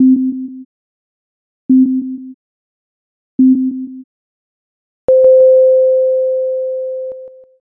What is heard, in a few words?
jiho